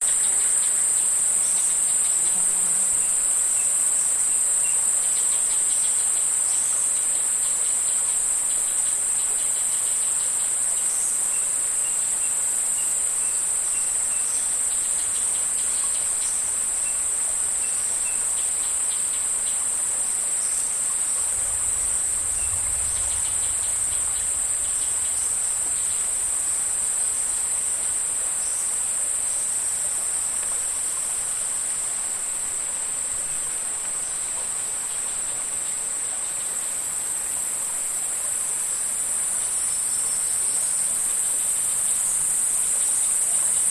nature rainforest crickets cicada birds flies creek close by plane at end